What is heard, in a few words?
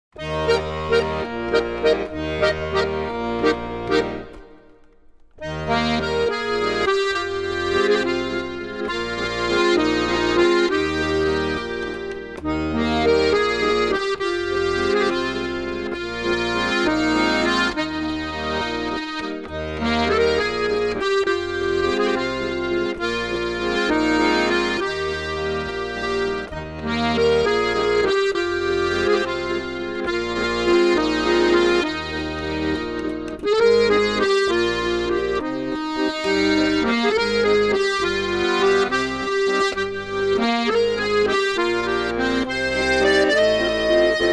Accordion Song; Sonic The Hedgehog 2006